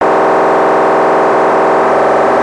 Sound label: brain driller